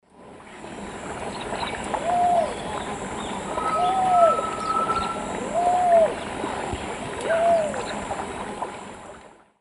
Patagioenas cayennensis, song-like call; S. America; Eastern Lowlands

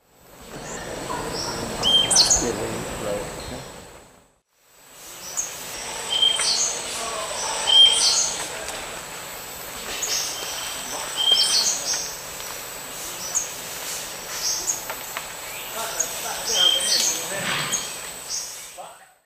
Euphonia minuta, call; S. America; Eastern Lowlands

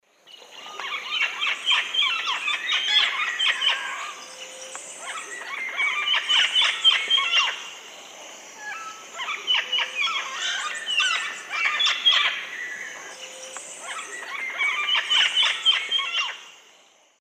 Deroptyus accipitrinus, calls; S. America; Eastern Lowlands

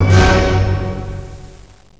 OCTAVE F, ORCH HITS

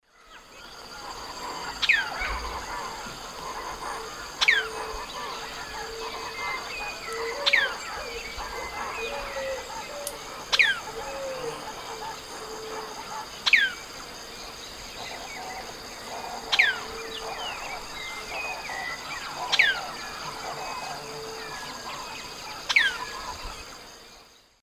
Elaenia spectabilis, song; S. America; Eastern Lowlands

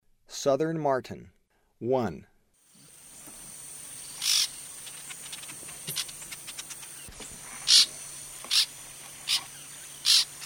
Southern Martin; S. America; Eastern Lowlands

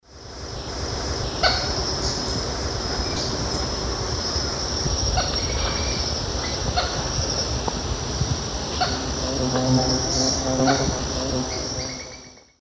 Micrastur gilvicollis, sharp calls; S. America; Eastern Lowlands

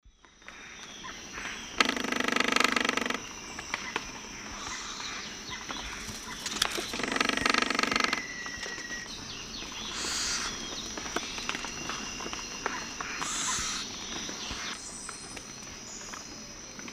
Piculus flavigula, drum and calls; S. America; Eastern Lowlands

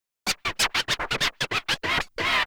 Scratch

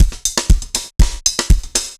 dance floor tight 120, loops

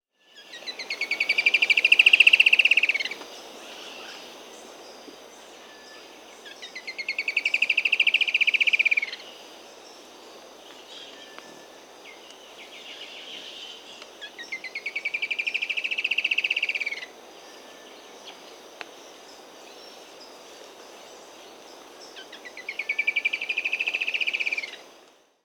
Pygmy Antwren (Myrmotherula brachyura), Peru; S. America

